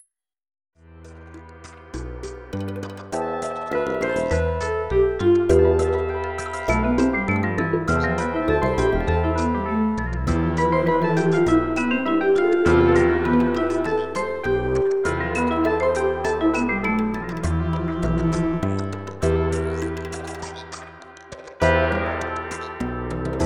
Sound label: drum